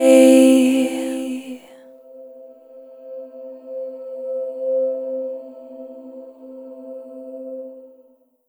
Chord C E 3rd, Vocal Samples; Sonic Foundry (Sony Creative Software); Pocket Diva World Class Vocal Samples

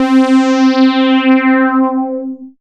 synth c, Sweep Synths

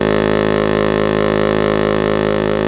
SAX Bass Sax G#1

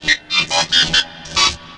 VFX, VOX ROBOT; Best Service ProSamples vol.49 - FX-Area; AKAI

